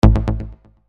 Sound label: Bass Shot A; Unison Artist Series; Mac Depulse